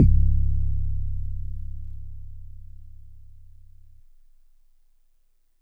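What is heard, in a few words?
Marimba DX100 C#2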